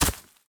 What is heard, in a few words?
weed 2 land; Golden Crayon Sounds